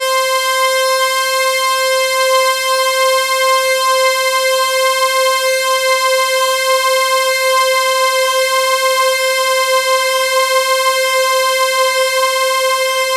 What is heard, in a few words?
C5, Synth Line; 90 s Sample CDs; NiceBeats; SynthLine VOL-1